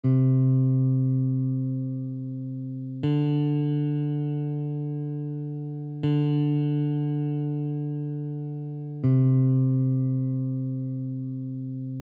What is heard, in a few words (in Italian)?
Intervallo di 2a maggiore ascendente e discendente